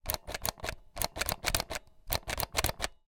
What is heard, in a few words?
toy nerf gun strongarm trigger pull misfire multiple